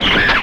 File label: Robot Walk; Mars Sortie